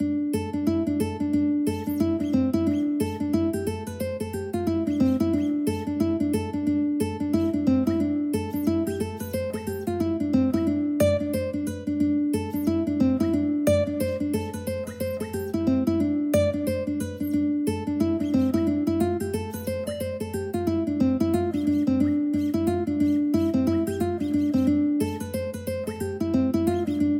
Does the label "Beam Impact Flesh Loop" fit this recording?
No